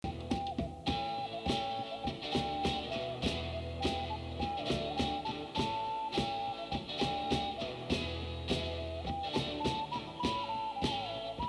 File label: flute part